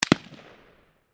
Gun Machine Gun, Gun, Machine, Gun, .223, Caliber, Single, Shot, Distant, 100, Feet, Towards, Bushmaster, Ar-15; Warfare; Machine Gun Sound Effects